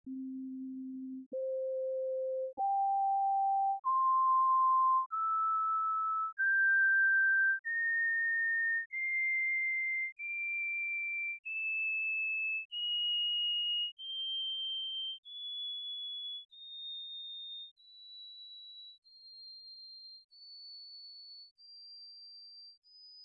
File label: trumpet harmonics